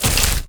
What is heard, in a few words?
death burst small